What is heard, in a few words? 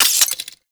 Quill Dart Gun Ice Mech